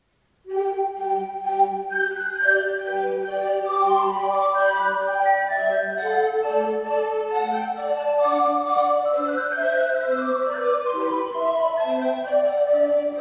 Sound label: Traditional Hornpipe